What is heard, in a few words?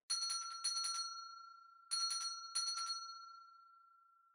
Winning Bell Far Gentle